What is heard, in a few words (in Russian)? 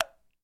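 вода капля спокойный приглушенный мягкий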